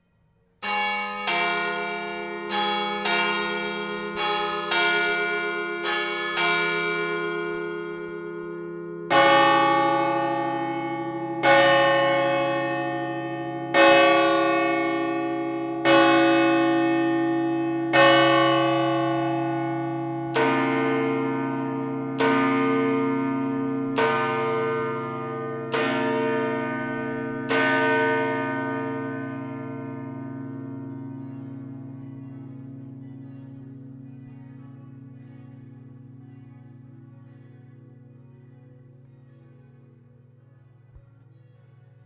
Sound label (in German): Willisau Pfarrkirche 17 heures; campanologie; Willisau (LU); Assemblée générale 2013 de la GCCS à Willisau (LU)